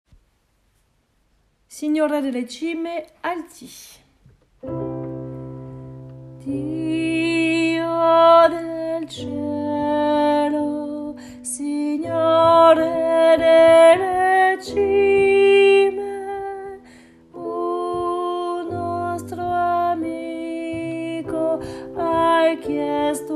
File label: Signore Delle Cime Alti; pupitre alti; enregistrements de travail